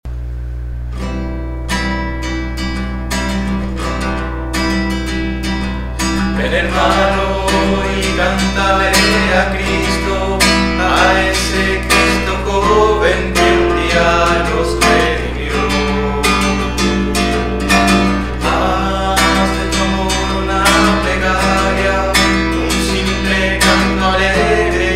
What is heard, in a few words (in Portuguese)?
encontro de musicos; cristo jovem